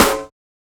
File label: Snare, Dubstep Snares